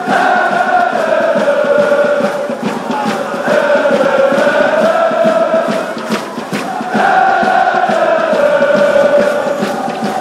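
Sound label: compilation of lincoln city chants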